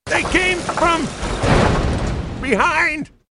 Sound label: Gyrocopter Behind